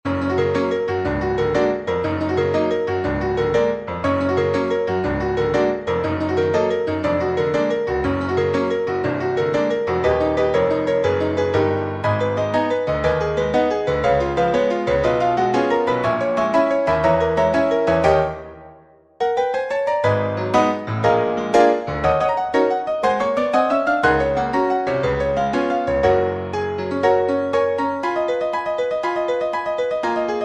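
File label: accompaniment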